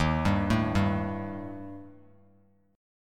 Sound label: D sharp Major Flat 5th Bass Standard; arpeggiated; D#Mb5; JGuitar